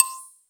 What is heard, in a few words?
xylo C5; XYLOPHASE; Synth